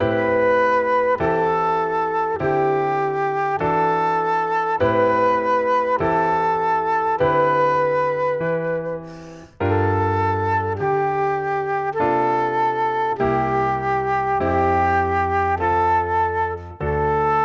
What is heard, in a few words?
Jazzy